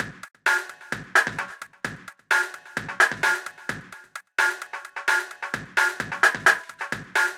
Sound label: Jungle Beat 172bpm, MIDI Drum; Ableton; Factory Packs